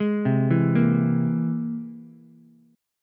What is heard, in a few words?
C Augmented 7th, Ukulele; arpeggiated; JGuitar